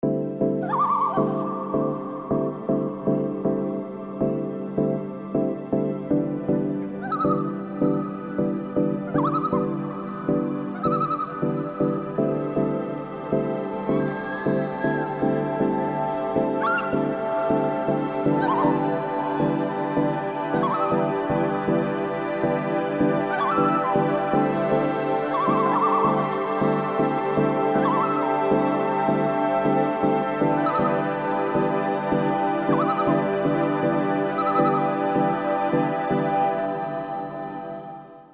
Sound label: ringtone